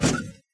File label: bump; There; ThereClient